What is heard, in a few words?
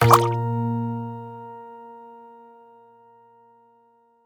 Bounce Wall